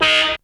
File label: CAR FX